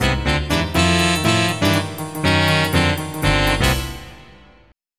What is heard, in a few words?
jazz